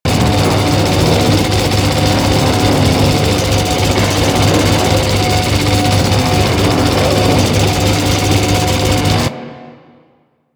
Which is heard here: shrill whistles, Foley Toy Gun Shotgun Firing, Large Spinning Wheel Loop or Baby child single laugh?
Large Spinning Wheel Loop